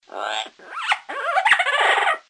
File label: Papagali Bird